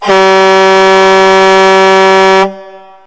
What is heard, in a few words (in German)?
lkw hupensound